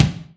Tiled Room Kick, Alesis HR16B drum machine

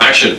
RAVE; Rubber Chicken Software Sample Bank; RAVE CITY

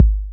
TR-808 Kick, Roland TR-808

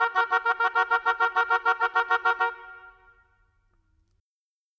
Oboes; Orchestral; Sonic Foundry (Sony Creative Software); Orchestral VOL-2; Modern

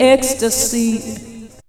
VOX SUNG; AMG Megabass REMIX! VOL-2

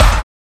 HiNRG, snares; RoBKTA Sample Pack Supreme

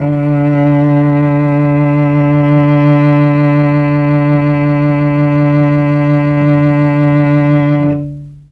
D2 300 CELLO, Mellotron, STRINGS; Mike Pinder - Mellotron Archive CD

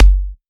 kicks; RoBKTA Sample Pack Supreme

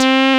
SYNTH; CMP - Analogon

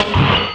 HIT, rhythm loops; Rarefaction - Poke in The Ear With A Sharp Stick

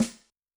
Loosy P, Yamaha DTXpress-II snare

